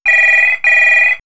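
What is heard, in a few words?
Incoming, electronic fx; X-Static Goldmine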